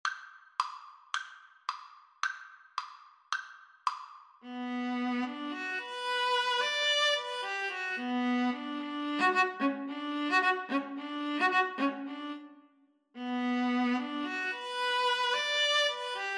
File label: Viola Duet